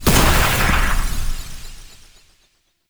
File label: Shock Globe Explosion; Warframe; Eximus